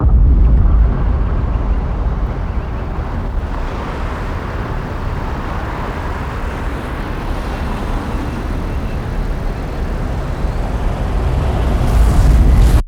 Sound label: Atmos, fx